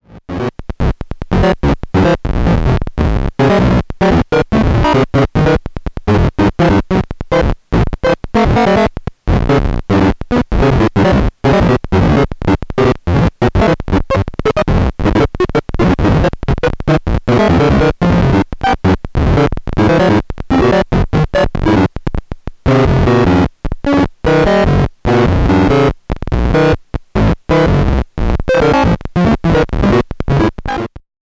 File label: MS-20 Science Ring Lab FX; MS-20 Patches